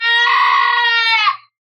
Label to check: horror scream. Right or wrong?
Right